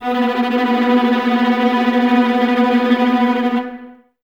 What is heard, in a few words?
Violas Tremolo B 2, String Ensembles; Miroslav Vitous